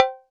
Cowbell

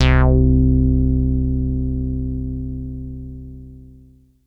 BASS Sharpy Acido Bass

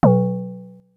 Synth, Techno Blip

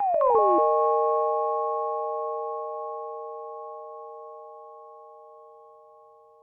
SYNTH riffz; Beautyon

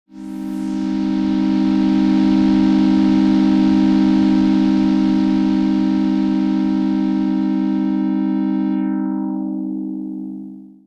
CHORD PAD Waves; Garlic

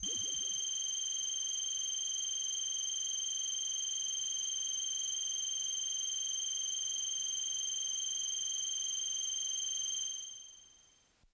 Bell Air Kawaii G-1

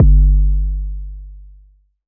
KICK G; Trunker

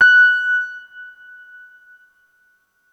soft tines Fs5

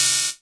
Hihat Open FX

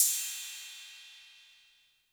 Cymbal 808 Tape Decay, Clean

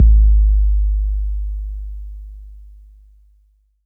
BD 808 Tape Decay

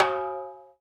Timbale Hard

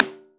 Rim Shot

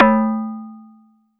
Perc; EFM

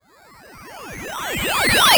Sine FX

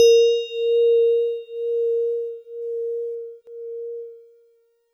DX100 As4; David Lynch

